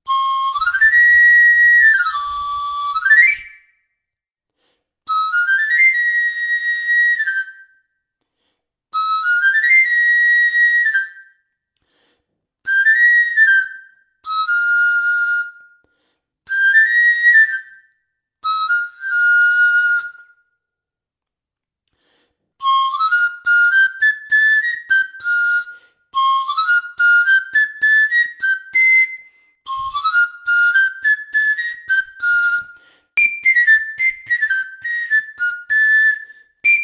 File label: chifre